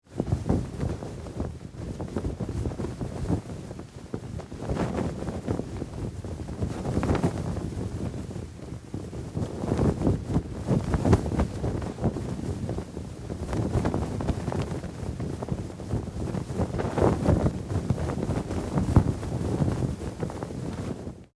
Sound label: Flag Foley Cloth Flapping In Wind; Household; Miscellaneous Household